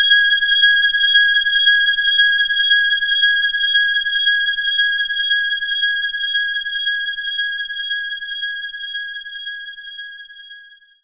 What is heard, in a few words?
Synth Strings C5; knights try